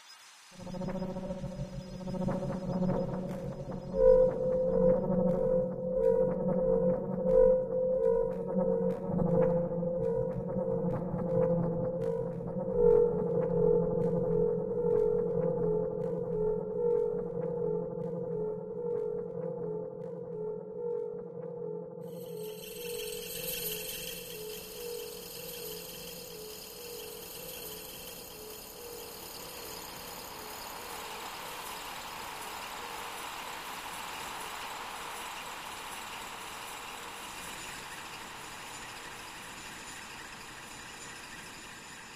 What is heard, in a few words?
In Brain Ambient Looped